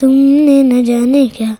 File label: CHILD VX C, Indian Traditions